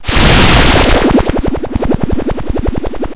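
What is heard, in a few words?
pod turbo; dosgames; brr; buggy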